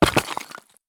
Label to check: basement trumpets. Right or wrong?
Wrong